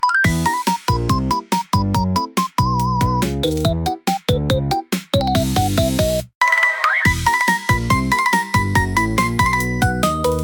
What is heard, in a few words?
instrumental